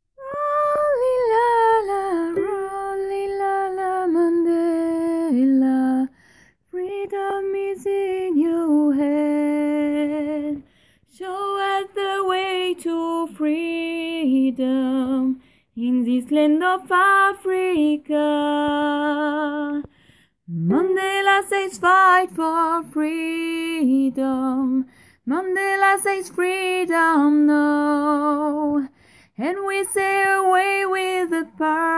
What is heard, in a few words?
alto